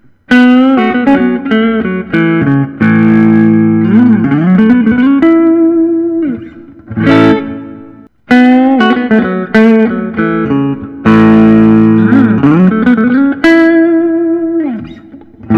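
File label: Collings City Limits Deluxe; tobacco sun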